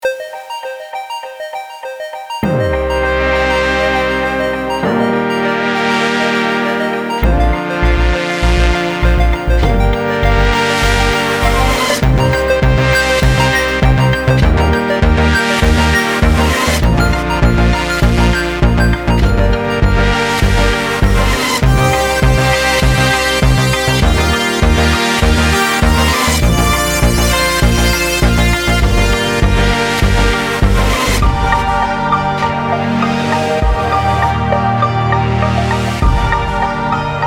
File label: strings